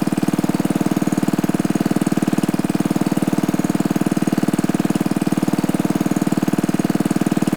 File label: go first gear loop, gokart; vehicles; sligwolf